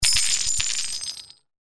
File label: Coins